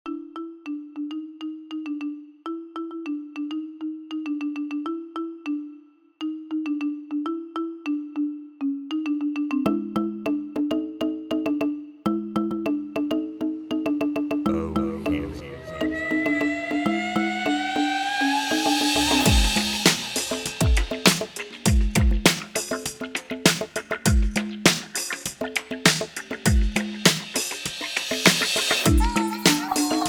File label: Conga Music